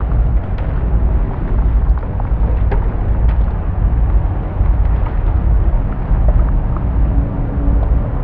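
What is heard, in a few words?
lithoid ambient destroyer hum; Stellaris; lithoids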